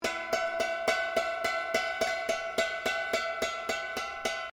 HAMMER DULCIMER EFFECT MF LOOP, Old Chinese Hammer Dulcimer; Strings (Sound Effects:Musical)